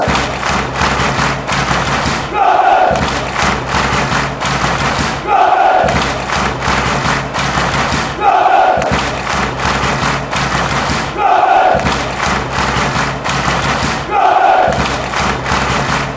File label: rovers clap; drfc